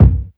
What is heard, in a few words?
clean kick drum single shot b key, Royality free kick single hit tuned to the B note; kick drum samples